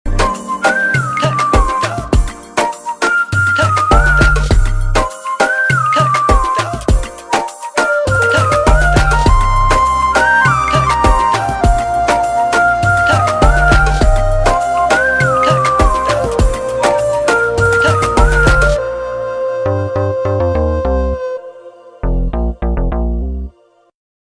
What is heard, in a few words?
whistle bgm ringtone; macherla niyojaka vargam; krithi shetty; anjali; mahati